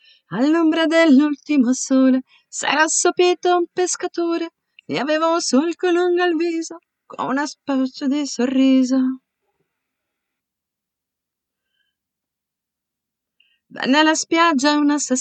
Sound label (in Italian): pescatore contralto